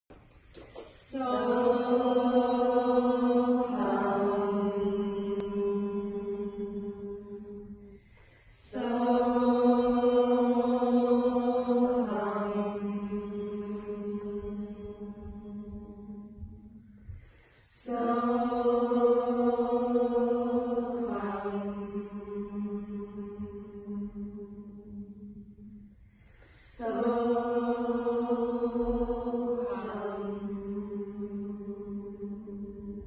Soham, مانترای سو هام

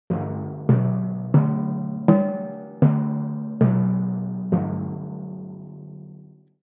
Timpani Drum

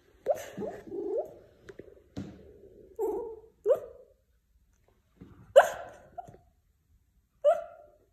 akita, سگ اکیتا ژاپنی

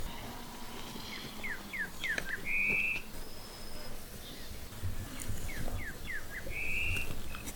Saltators, پرنده پایکوب

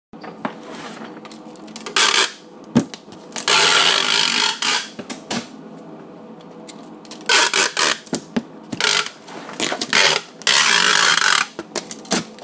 packing

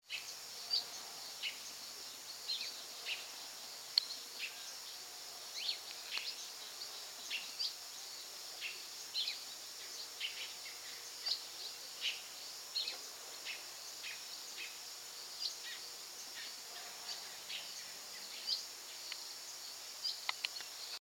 Scarlet throated tanager

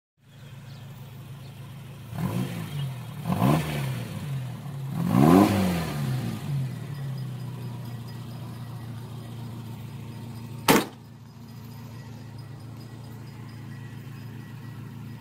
suzuki carry

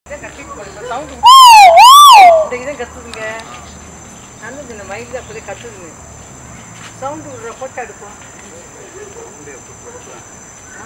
Great argus; پرنده آرگوس بزرگ

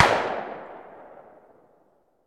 Gun Shot Sngl Fire In

